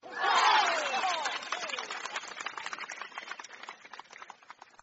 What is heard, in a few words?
sound win; Wheel Race